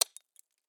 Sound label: ice hit